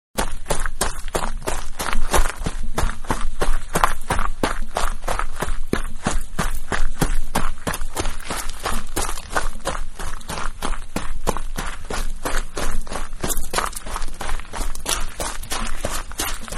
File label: Running On Gravel; Running (Sound Effects:Human); Manhattan Production Music